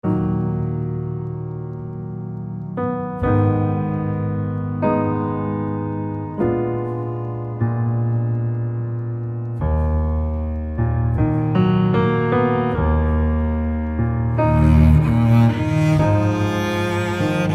familial love; Manamon Music; Manamon 2

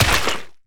mud land; Golden Crayon Sounds

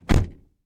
door close; Golden Crayon Sounds

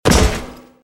grate land; Original TK Sounds

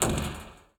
roof step; Golden Crayon Sounds

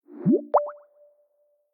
File Transfer Incoming; Skype Sounds

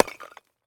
wall brick; Golden Crayon Sounds

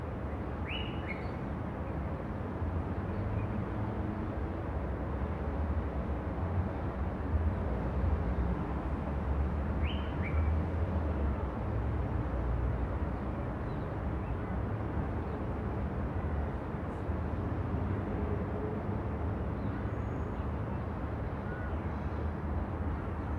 distant traffic; Golden Crayon Sounds

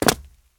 concrete land; Golden Crayon Sounds

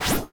object open; ChromeVox Classic Sounds

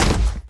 tile hard land; Original TK Sounds

